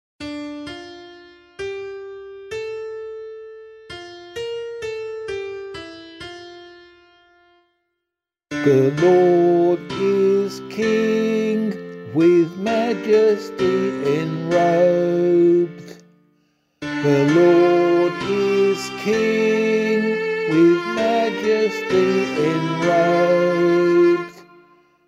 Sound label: Psalm, vocal